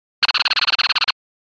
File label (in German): vogeltraeume